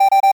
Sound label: System pipipi lower